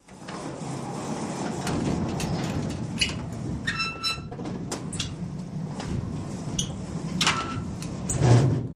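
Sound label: Barn Door Metal Slide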